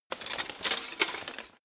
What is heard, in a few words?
walk single